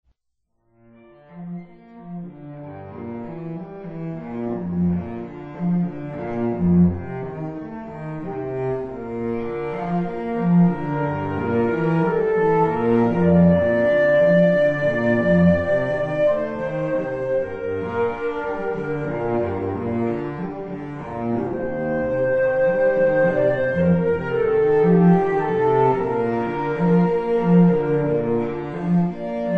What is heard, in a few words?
Flute Cello; Wedding Ceremony; Ceremony Musicians